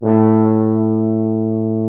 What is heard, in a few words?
Orchestral Brass